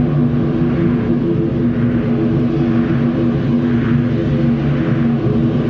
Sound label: whine